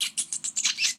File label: Transformation; gamerip